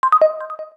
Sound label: Key Clear, keypad; Samsung SGH-A257